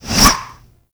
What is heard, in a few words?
Whip Whoosh, Weapons